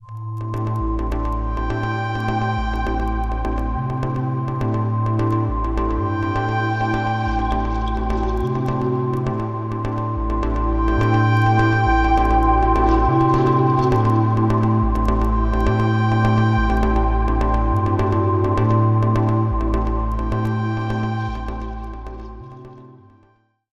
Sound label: Padded Static Music Pad